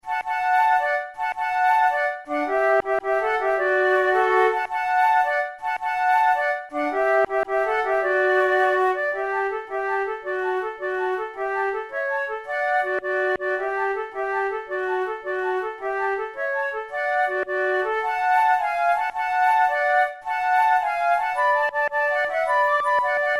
duet, flute

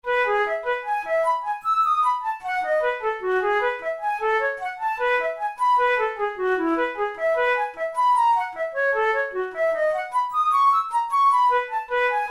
methode pour la flute study; drouet

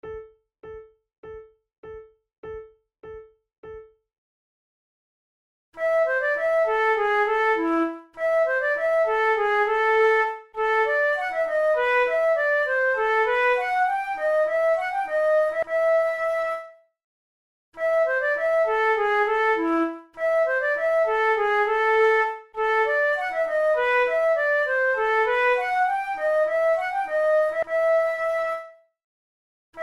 allemande, flute

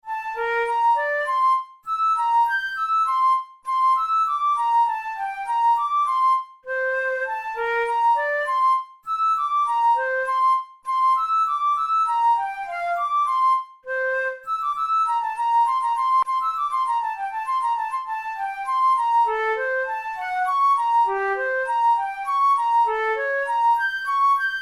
flute; cristaldi; la flute elastique

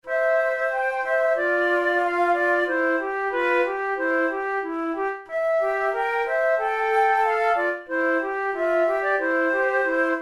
methode de flute; tulou

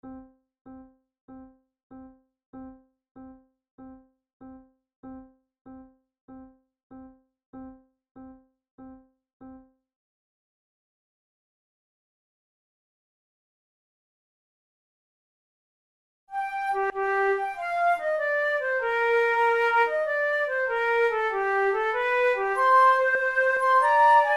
flute sonata allegro; mattheson

